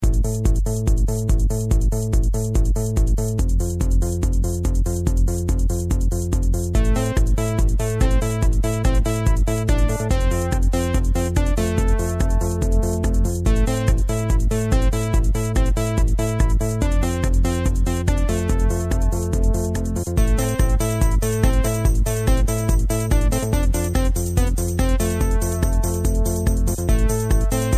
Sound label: Techno